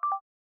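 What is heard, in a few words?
bell glitch C major; bespoke - Floral v.2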